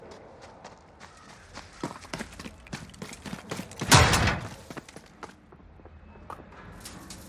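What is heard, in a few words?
running with door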